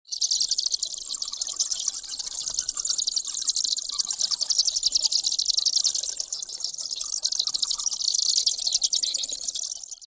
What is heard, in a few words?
Bat noises